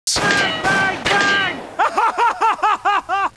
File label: kitchen gun; props taunts classic; phx new taunts pack